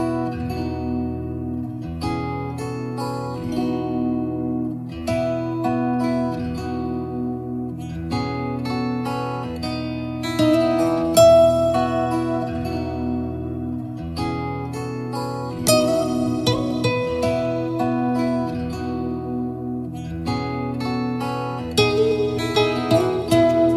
ringtone bollywood ringtones

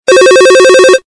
low phone ringtone

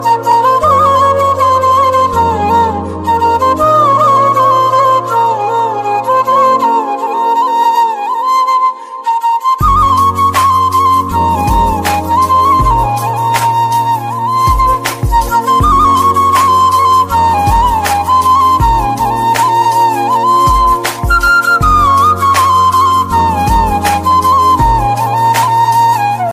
instrumental flute ringtone

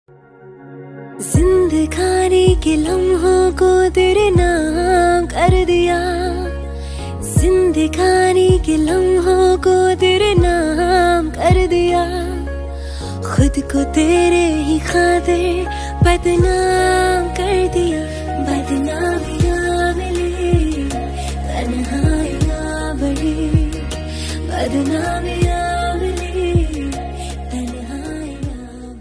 acoustics ringtone; bollywood ringtones

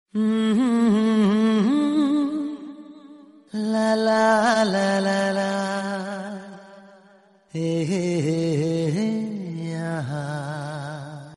song movie tone ringtone, bollywood; fanna

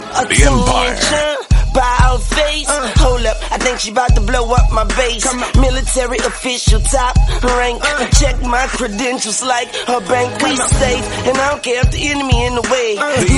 free fast music tone ringtone, english ringtones

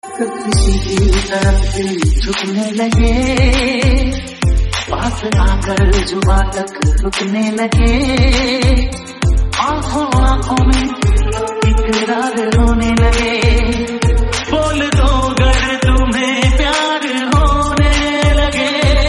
romantic mashup tone ringtone, bollywood

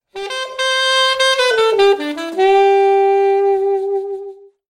sax tone ringtone

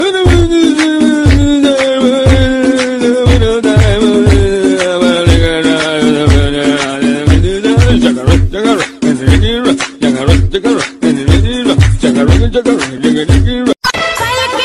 funny indian music ringtone

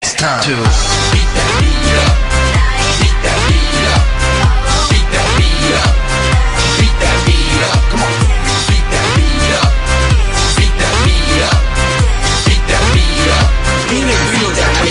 rap english song tone

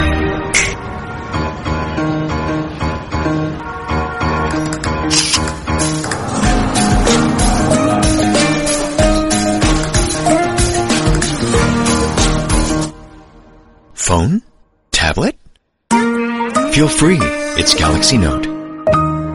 galaxy note ad ringtone; nokia ringtones

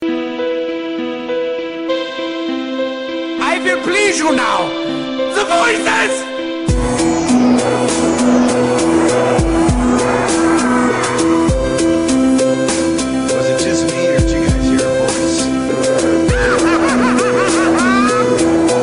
zombie voices techno ringtone

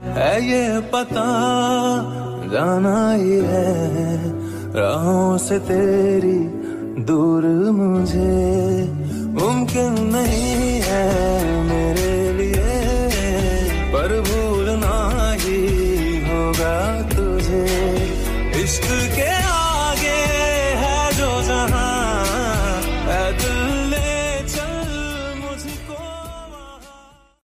punjabi ringtone

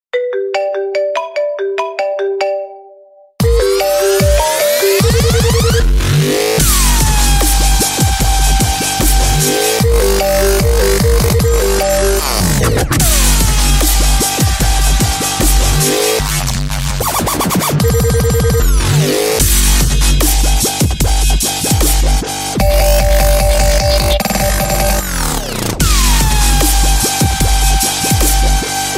apple dub ringtone

